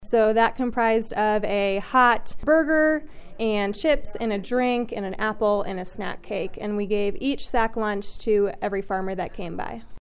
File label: Bass